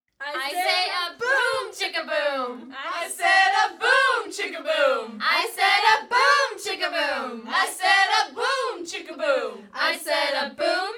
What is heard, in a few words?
Boom Chicka Boom